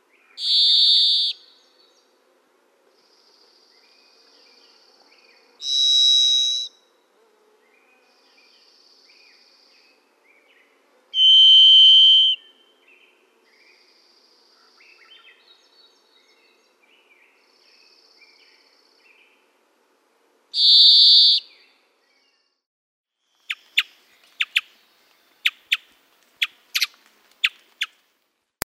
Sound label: Varied Thrush